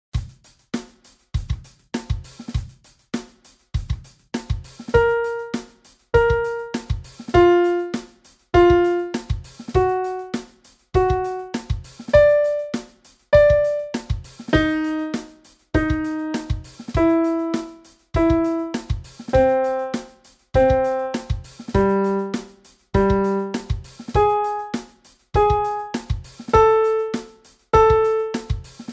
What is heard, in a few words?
random Pitch Practice